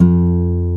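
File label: F1 MARTIN, acoustic guitar; AKAI S6000 CD-ROM - Volume 1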